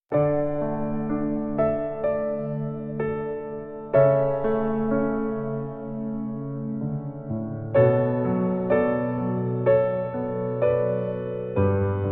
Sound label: Piano Relaxation